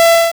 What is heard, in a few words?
Powerup